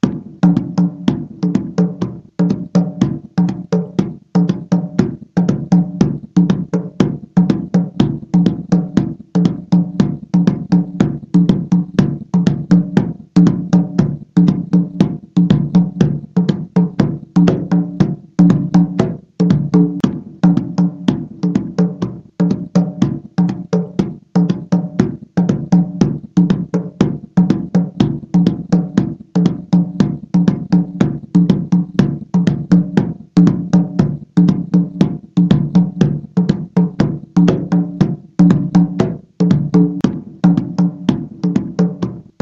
Batucada Congas

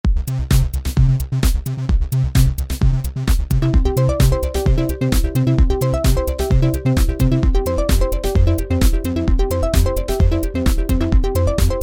synth

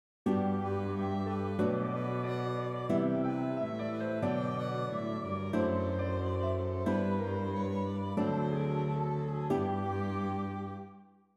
Mozart and Jazz

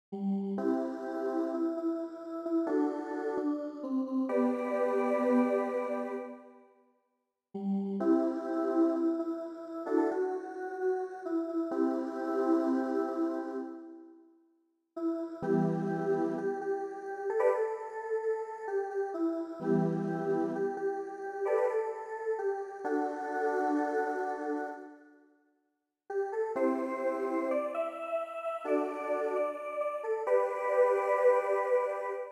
Melody

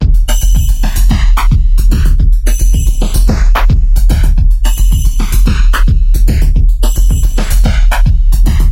bassline drumloop; bassline project

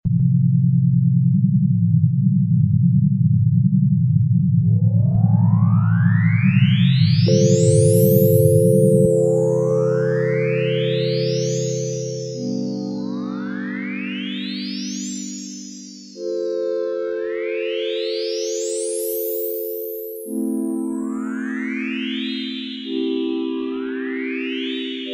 superbowl player's entrance piece

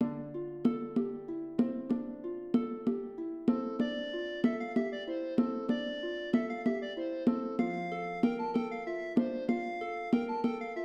Medieval Theme

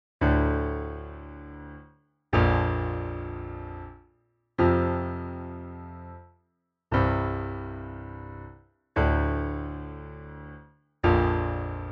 solo piano

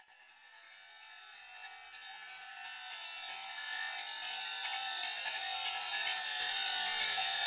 Ultrabeat bip

